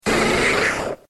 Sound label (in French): Cri; Cri de Coconfort dans {{Jeu XY}}.